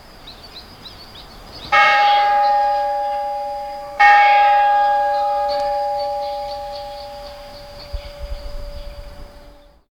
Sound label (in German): eine kirchturmglocke schl